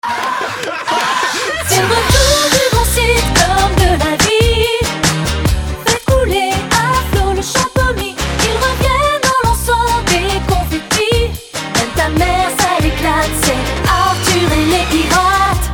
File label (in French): Chant Jingle; Europe 2; Arthur les Pirates